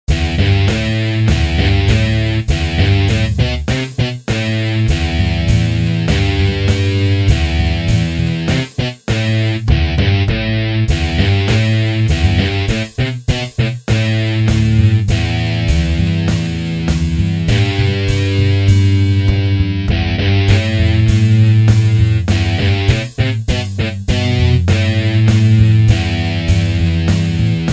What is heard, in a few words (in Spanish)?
midi ensayo